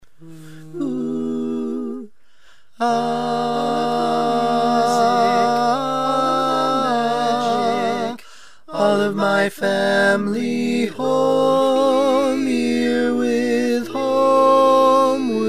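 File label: barbershop tags, baritone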